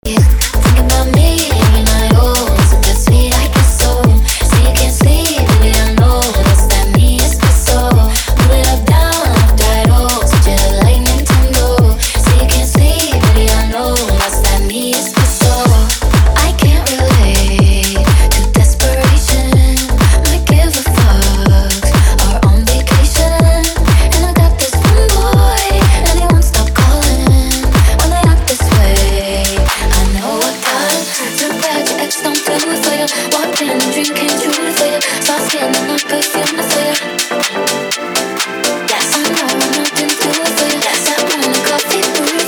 Club Party

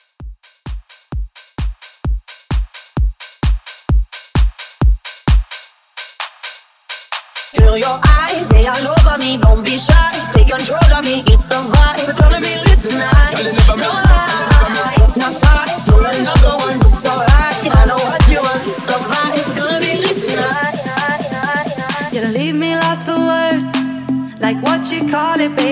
House Mixshow 130

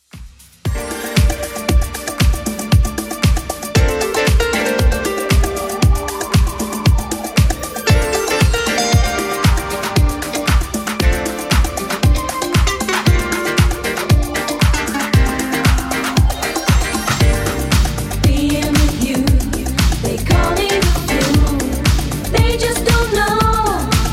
80s Redrum 116bpm

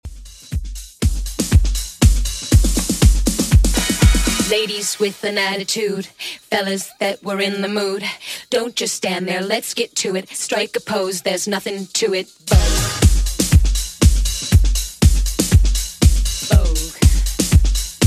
90s Redrum 120bpm

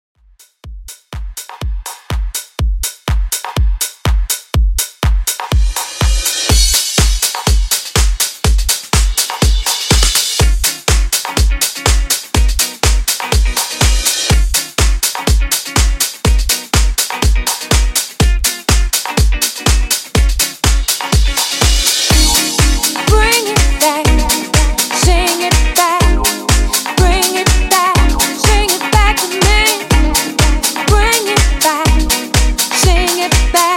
90s techno redrum 123 bpm

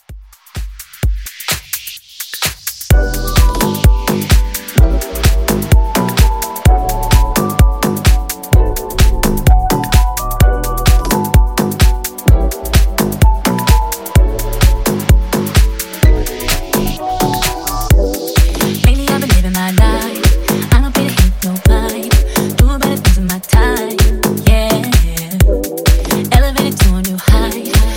Funk House Remix 128 Bpm

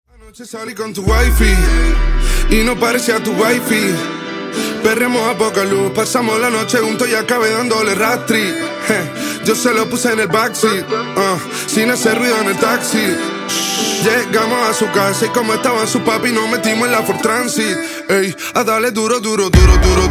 104 Bpm; Dj Intro Outro

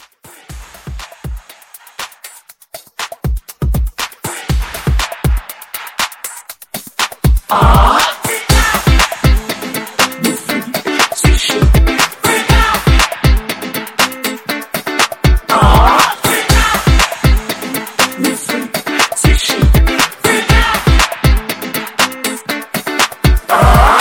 Classic Edit

